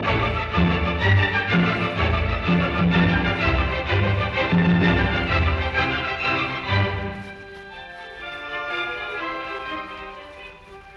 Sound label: Symphony in D minor Choral Molto vivace; Symphony No 9; Op 125; Arturo Toscanini